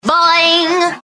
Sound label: boing; cstrike; forlix